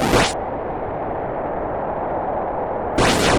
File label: Fly; Celerity